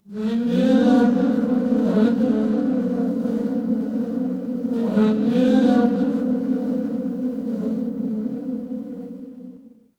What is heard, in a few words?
crowd gasp